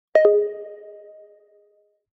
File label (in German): Übergang Signal